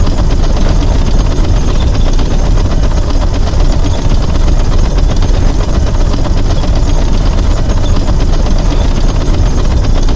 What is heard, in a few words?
Tank Move; DCS